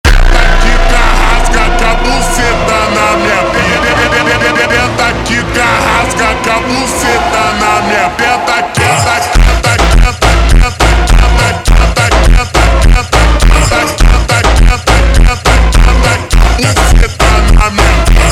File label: Funk Super Slowed